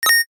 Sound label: sting; message tones